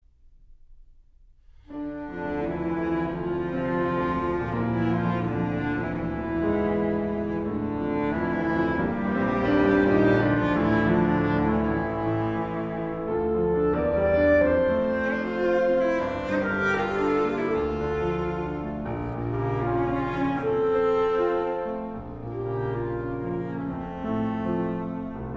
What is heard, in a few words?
Clarinet Trio, Allegro ma non troppo